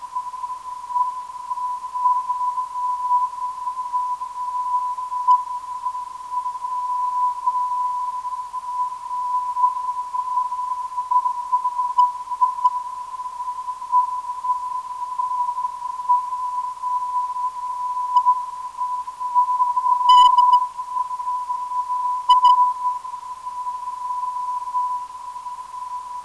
WR 289kHz, ndb

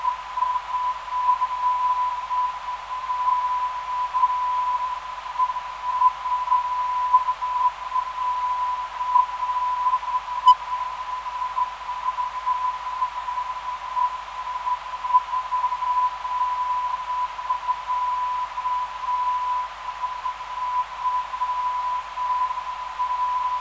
986.03kHz, ndb

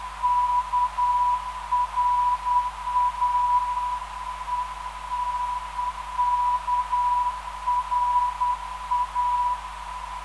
379kHz, NDB; KRA

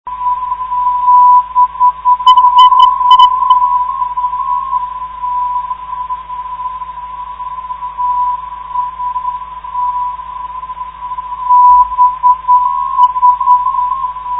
bs 376khz, ndb

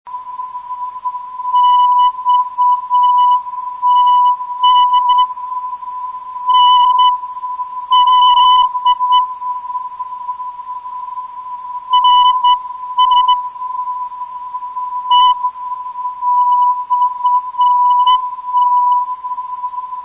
xt 312khz, NDB

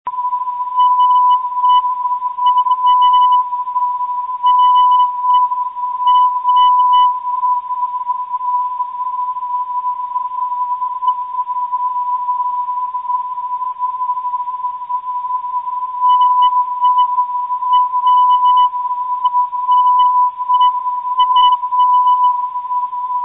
na 512khz, NDB

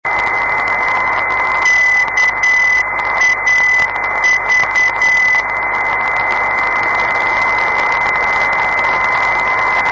m 266khz brezfiltra, ndb

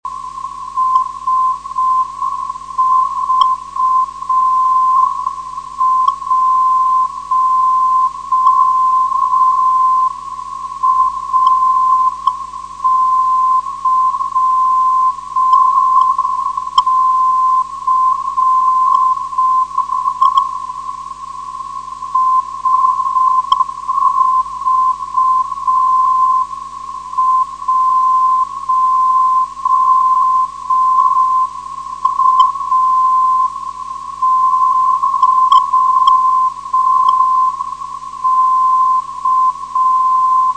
478.65khz, ndb

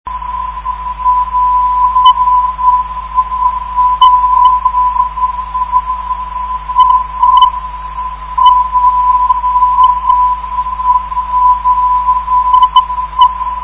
212khz, ndb

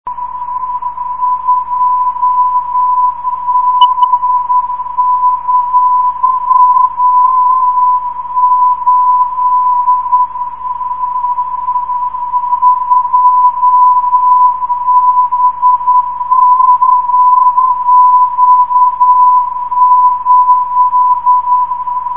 bkq 410khz, ndb

